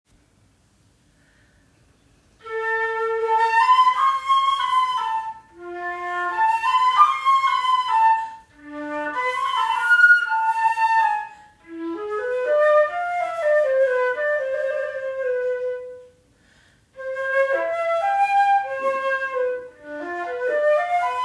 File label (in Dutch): Sonate a moll